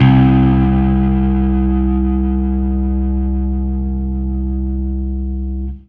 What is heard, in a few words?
Fuzz C4; Torchlight III; PS4